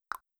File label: sound send